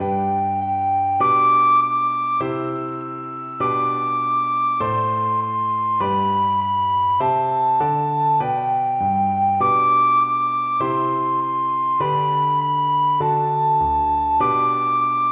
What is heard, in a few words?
twinkle twinkle, recorder